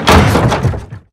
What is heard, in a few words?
hit cockpit metal